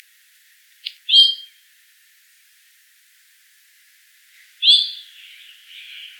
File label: Icterus cucullatus; ecologia paisaje sonoro